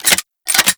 Heat Gun Reload; Warframe; Grineer

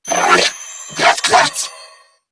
Surprised Female; New War; Narmer Grineer

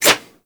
Grineer Manic Claw Swipe

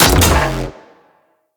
Shotgun Shot; Weapons; Corpus

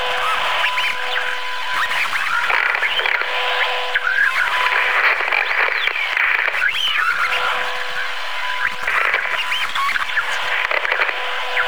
Forest Camera Console Loop; Veilbreaker; Grineer Forest Thumper; Kahl

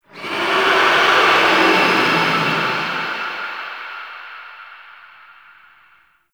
Attack Verb; Enemies; Warframe; Tau; Sentient Dactolyst

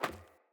Sandals Run Metal, Footsteps

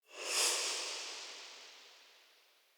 Menu Enter; UI; Avakot

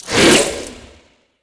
Whip Whoosh; Warframes; Khora

